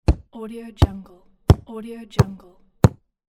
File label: football kick